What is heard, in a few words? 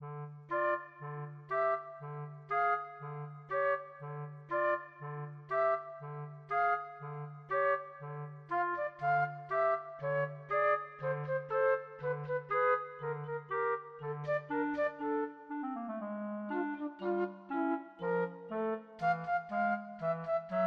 sugar plum, flute clarinet duet; tchaikovksy